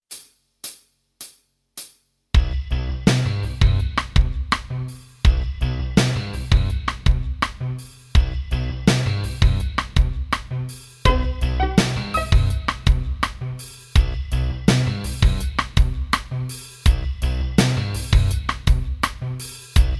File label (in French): VERSION KARAOKE; Alex et Zoé 1